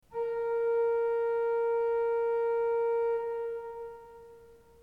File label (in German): ton, orgel